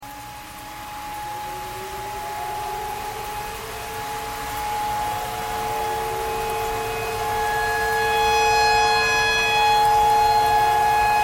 tornado siren tornado siren thread pentatonic

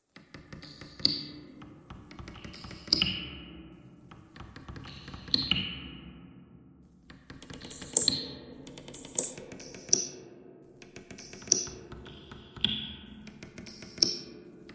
bagpipe thread noise; reverb

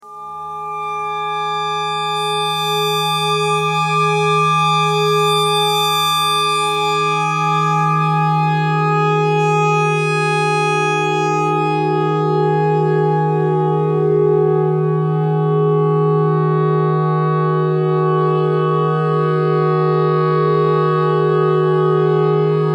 clarinet notes e

